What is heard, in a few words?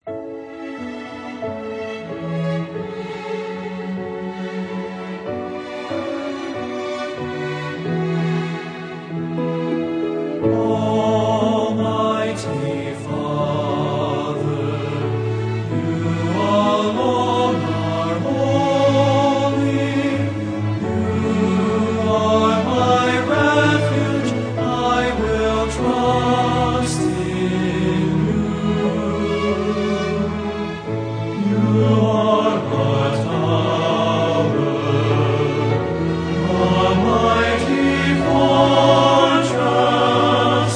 Singers And Orchestra